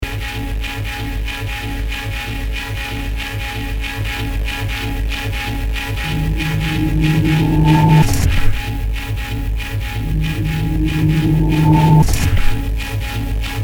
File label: Beat Frm Multi Tap Dstrtd